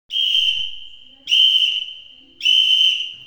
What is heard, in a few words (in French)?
sifflet